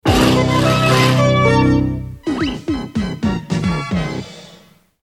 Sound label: music bumper